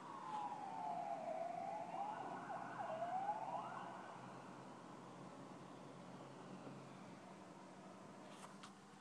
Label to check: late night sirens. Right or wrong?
Right